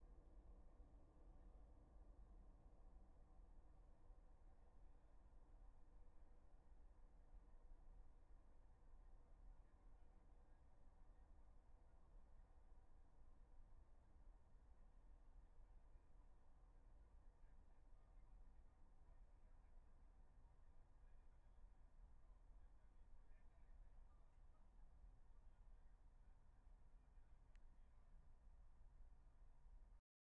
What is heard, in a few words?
airplane noise, headphones; sony wh-1000xm6; Sony's WH-1000XM lineup